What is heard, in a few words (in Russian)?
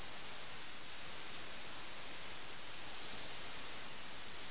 gornaya tryasoguzka motacilla cinerea